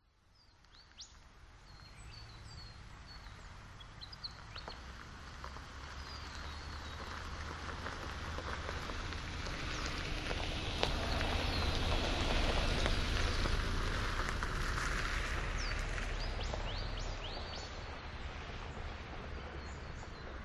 vehicles car suv pass by slow gravel grit birds nissan patrol